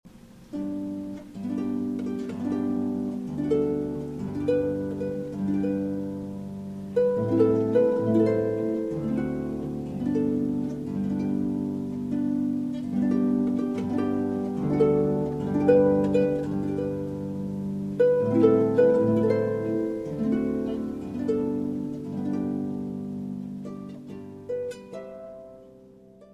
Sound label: Xmas Tree, harp; Oh Christmas Tree